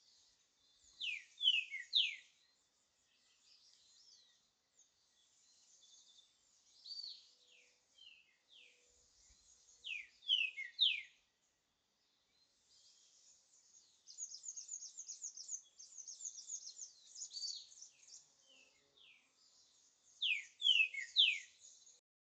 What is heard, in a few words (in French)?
Saltator gros bec Saltator albicollis Lesser Antillean Saltator; Griv gwo bèk